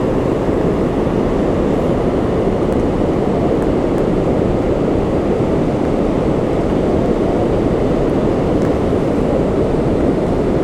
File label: Rogie Falls Waterfall Lo Fi Lavalier Mic